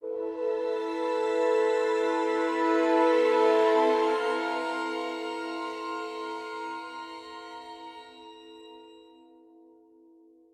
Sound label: Stingers - Sad; Planet Alpha